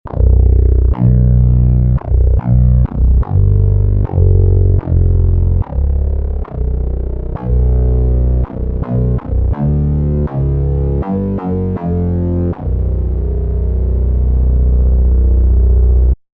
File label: asm hydrasynth explorer test sound